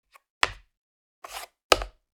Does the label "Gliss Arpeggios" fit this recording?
No